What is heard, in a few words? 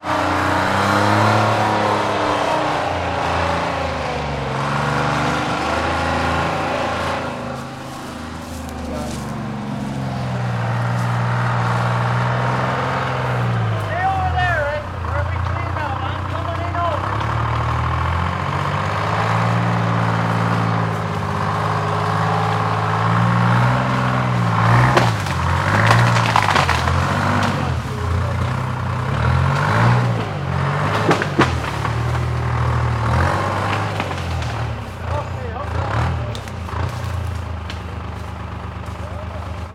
industrial logging skidder picks up tree men shouting; sound effects one